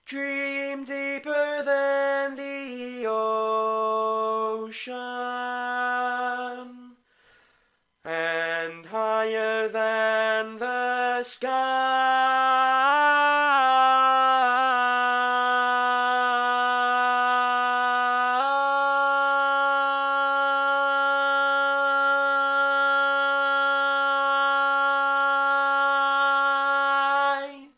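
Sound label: Baritone, barbershop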